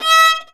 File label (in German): GEIGE, Alpine Volksmusik